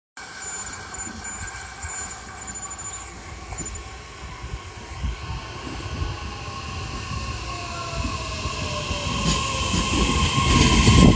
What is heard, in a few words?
rail sound